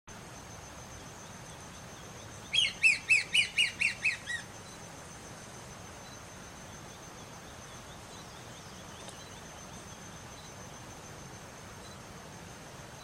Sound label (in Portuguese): Canto do flautim pardo